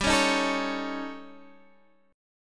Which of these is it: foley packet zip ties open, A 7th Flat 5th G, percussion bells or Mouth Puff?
A 7th Flat 5th G